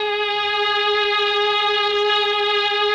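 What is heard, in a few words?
MELLOSTR, Mellotron Violins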